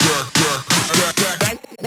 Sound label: Fill 128 BPM